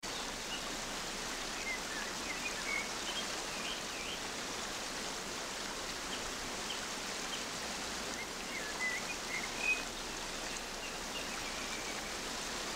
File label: waterfall